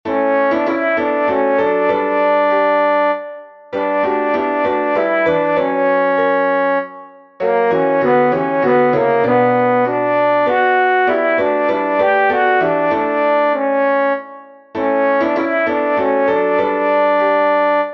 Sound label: tenor, choir; loves redeeming work is done; by David Ogden